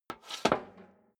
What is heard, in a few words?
step high heels metal rattle scuff; schritte